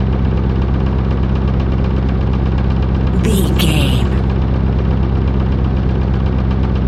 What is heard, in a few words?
engine generator loop